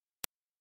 kein Playback, a cappella

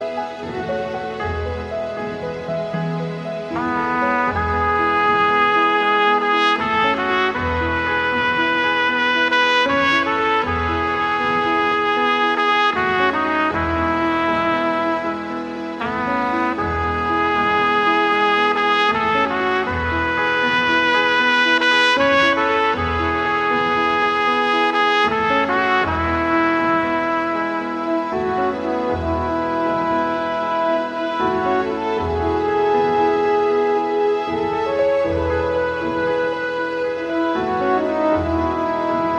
Trompete

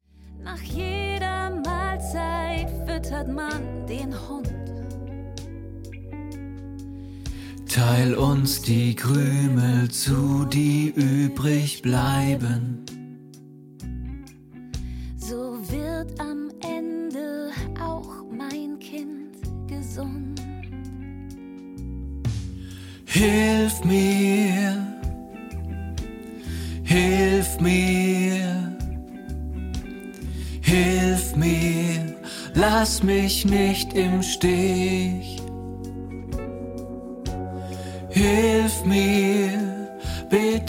Lern-Tenor

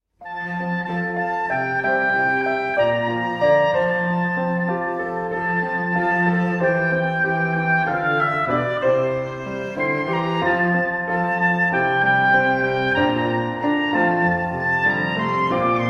Chor

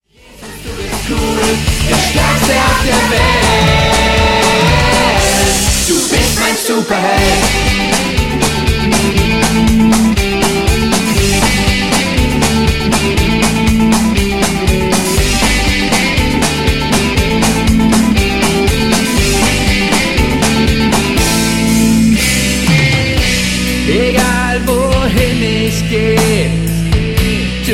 Blues, Chor

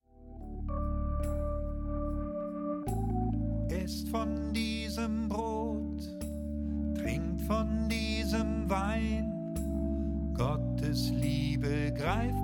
Lern-Tenor

